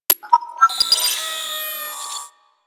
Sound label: Computers Random E; Warframe; Ambience; Grineer; Grn Computer Room 1